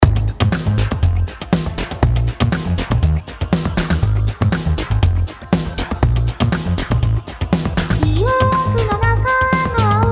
SOLID BUS COMP

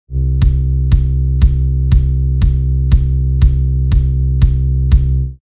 sidechain no

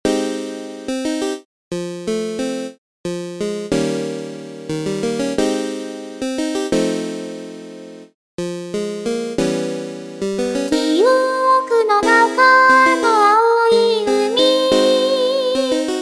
RETRO MACHINES MK2

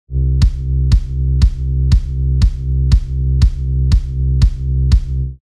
sidechain on

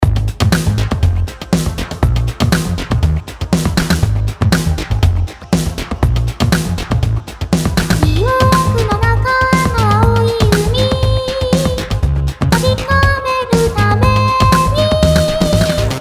DRUMLAB

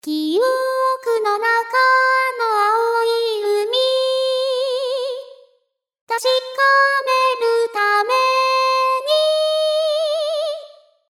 vib

